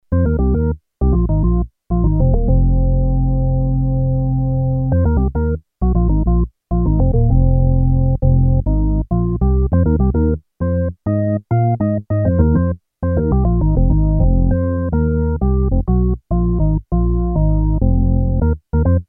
Organ max, Kawai sx240

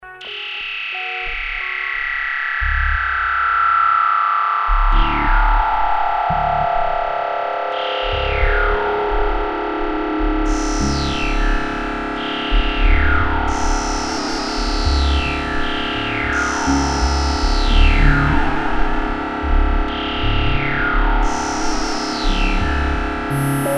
synth drone, roland jd800

